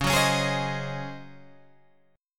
C sharp Major 7th Suspended 2nd Suspended 4th Guitar Open G